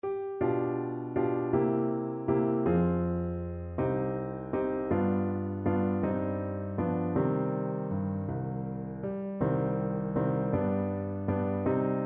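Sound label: alla nostra signora, alto saxophone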